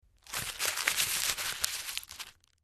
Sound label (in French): papier chiffone; missmandarine